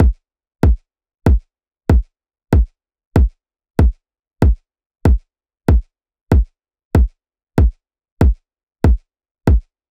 Reggaeton Kit 95bpm Kick; Drum Kits; Stems; Ghosthack - Ultimate EDM Drums; Reggaeton Kit 07

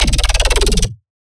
Bass Shot; Trap and Dubstep; Bass One Shots; Ghosthack; Free Trap and Dubstep Samples 2018

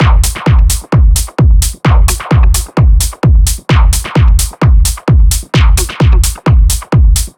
Drum Loop 130, Techno

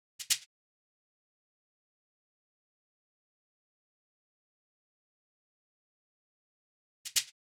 Drum Loop, 140 BPM Percussion, Drill; Loop Stems; Brute

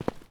Footstep Dirt Medium; Footsteps

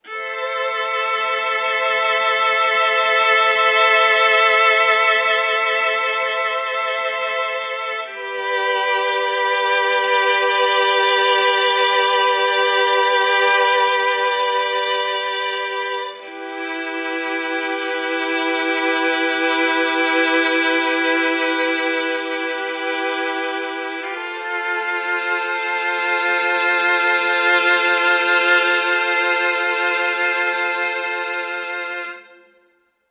Solo Section Harmony - Am - 120BPM; Construction Kits; STEMS; Ghosthack; SkyRealm; Kit 09 - Shallow Valley

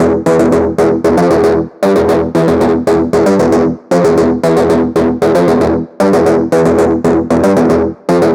Acid Melody - Cmaj 115BPM, Leads; Crossfire; Ghosthack

